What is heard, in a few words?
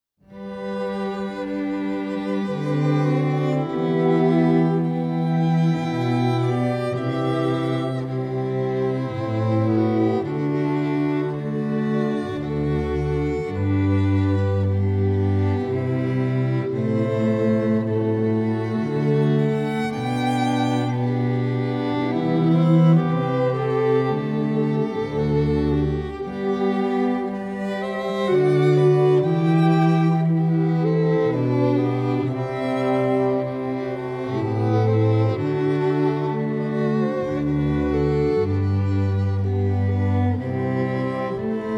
Passacaile, Classical Quartet; Handel; Celebration String Quartet